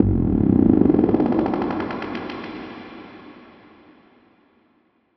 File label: Techno FX 135; AC2024 - Day 1 - Techno Sounds 2025